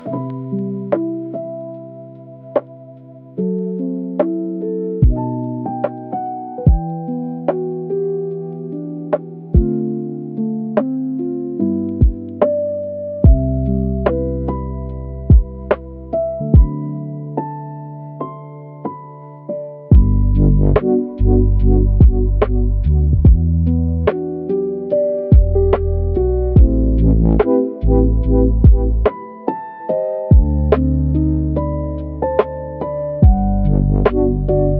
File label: Rain on Old Keys, lofi piano for recall and nostalgia